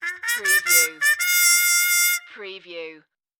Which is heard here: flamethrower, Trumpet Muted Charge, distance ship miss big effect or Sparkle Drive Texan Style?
Trumpet Muted Charge